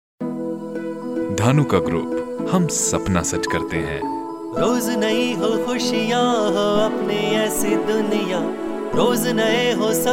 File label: Jingle; Dhanuka Group